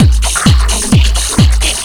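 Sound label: drum loop 130, right; House; Best Service ProSamples vol.10 - House; AKAI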